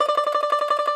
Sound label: T. BANJO, Tenor Banjo; Plucked Strings; E-MU Producer Series Vol. 7 – Old World Instruments